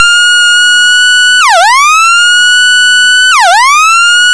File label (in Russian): sirena